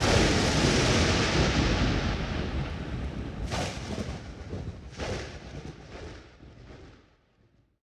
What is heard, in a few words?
Lightning Strike With Flares; Sony Pictures Sound Effects Series Volumes 1-10; Sony Creative Software